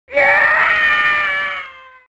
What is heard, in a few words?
scientist death; crash bandicoot